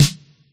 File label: snare, 505; Tone.js